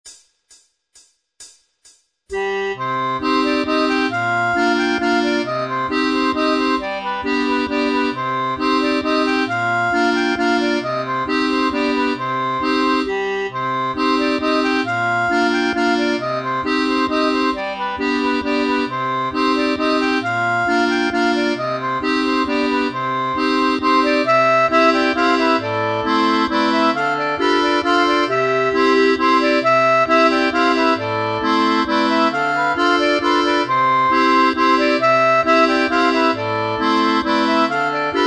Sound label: Mazurka; Fubu